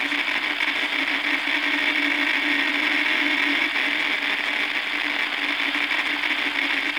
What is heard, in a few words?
Static Proximity Loop; Shadowgrapher; Warframe